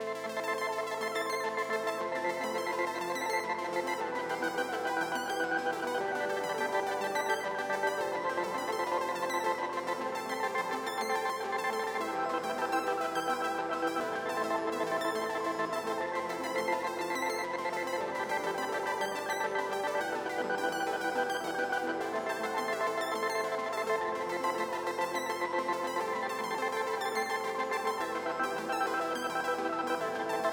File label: layered short loop